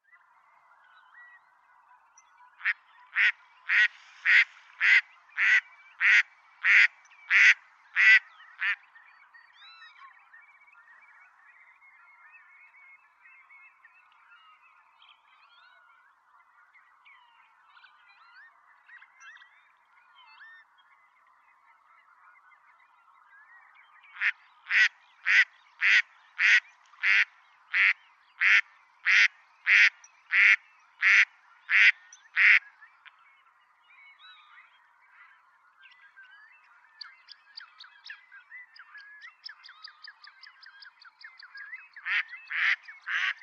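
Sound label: mallard anas platyrhynchos; kryakva; utok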